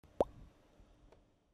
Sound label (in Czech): bublina